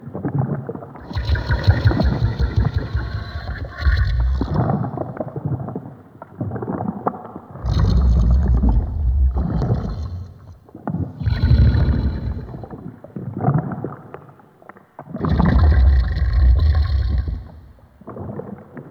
Infested Exterior Hub Plant Chatter Loop; Ambience; Props; Warframe; Infested Eris

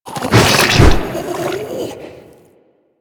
Infested Ancient Strike Flesh; Warframe

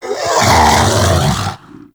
Predator Vocal Captured Idle; Warframe; Hunting Critters; Characters

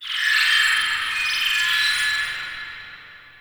Guild High Tech; Warframe

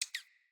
Bird Double Chirp; Paragon Bird Guide

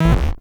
Corrupted Notes; Warframe; Bard Quest; Bard Boss Fight Notes

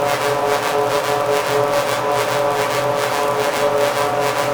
Artifact Loop; Warframe; Defendable Artifact

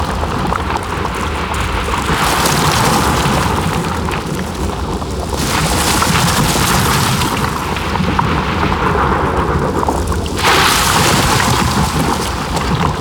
Landscape Heat Fissure Loop; Gameplay; Warframe; Venus Open World

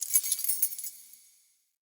Key Pickup

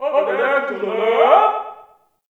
Ambient Campsite Yells; Warframe; Tau

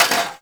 Cafe Chair Short; Ambience; Gameplay; Warframe; Nineteen Ninety Nine

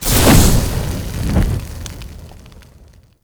Fire Torch Burst; Ambience; Props; Warframe; Tau; Circles Of Hell

